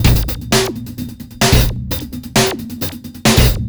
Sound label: 130 Garage Drum Loop Dist; Dance DJ; Drums; Garage Breaks; Pro Tools Essential Loops; Digidesign